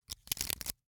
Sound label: Hwatu, card game, hard plastic, small, clatter, stack, drop, tight; Eiravaein Works; Vaeyan IV